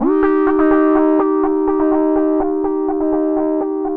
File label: Analog Rhythm